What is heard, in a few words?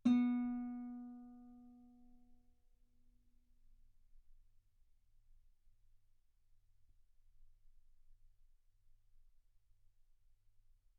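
Guitar ff B string B3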